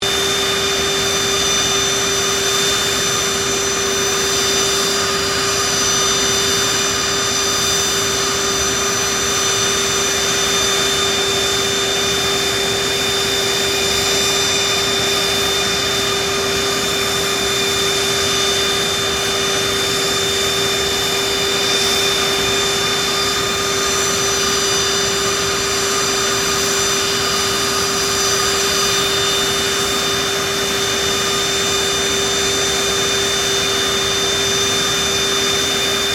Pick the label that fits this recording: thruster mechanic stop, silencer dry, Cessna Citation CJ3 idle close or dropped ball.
Cessna Citation CJ3 idle close